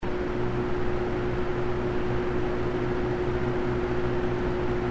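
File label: Fuzzy Medium; K-Tek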